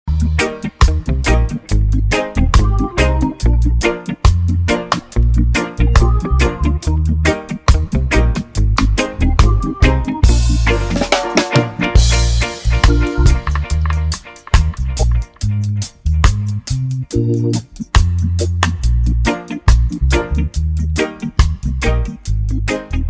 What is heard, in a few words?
Reggae